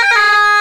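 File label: PIPE CHANTER, Bagpipes; E-MU Formula 4000 Series Vol. 4; Earth Tones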